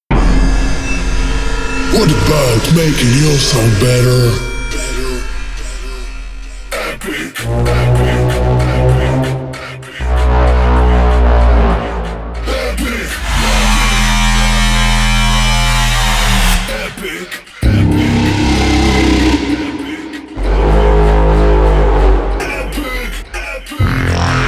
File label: EPIC HORNS